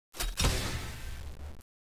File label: case open; cstrike; csgo